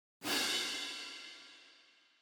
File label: Cymbals